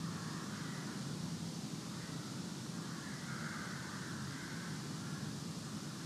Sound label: Ambi Loop, outdoor samples Ambience Loops